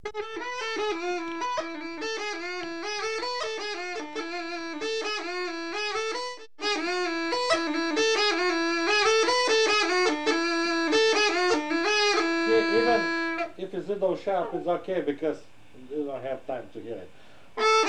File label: F natural half-hole exercises, Gajda; Gajda Lesson